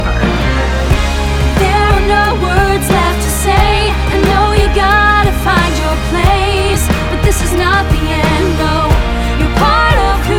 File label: Vocal; Descendants